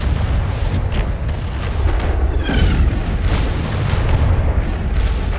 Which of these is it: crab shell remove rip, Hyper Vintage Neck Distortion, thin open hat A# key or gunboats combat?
gunboats combat